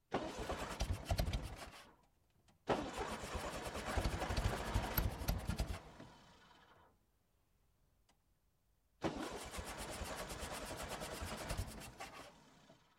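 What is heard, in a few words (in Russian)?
zvuki startera avtomobilia, car starter sounds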